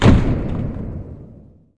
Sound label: boom; squidGame